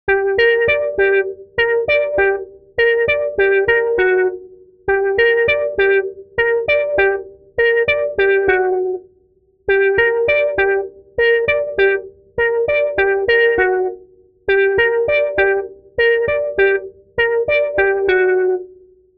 lead sound envelope long attack low pass; universal audio moog multimode filter xl

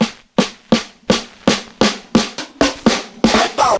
drumfill 126 skippin; Wave Alchemy Loop Tools and Grooves

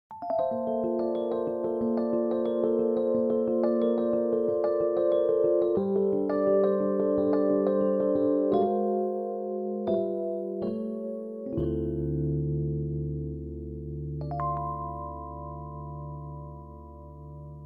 yamaha cp40 stage rhodes

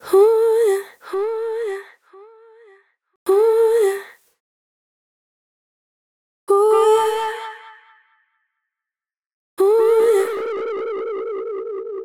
tape delay; Delay-Plugins; softube

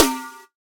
Snare

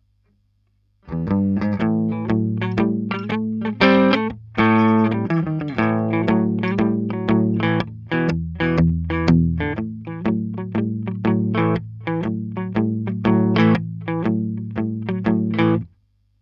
germanium drive direct input; Chandler Limited

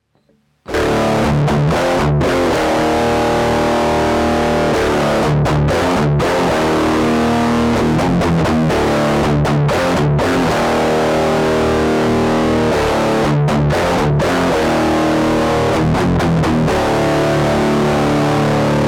blackstar; subdecay

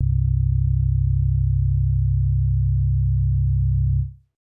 sine chord, fm synthese; yamaha

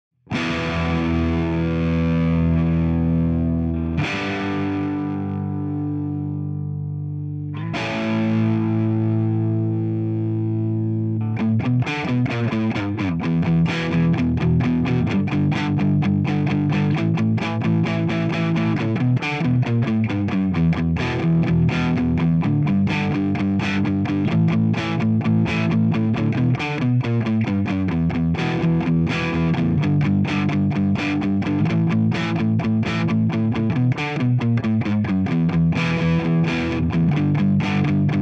rhythm plexi; mercuriall spark test

